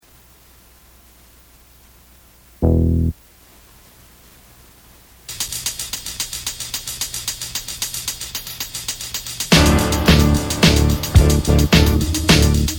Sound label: Hard Bap Study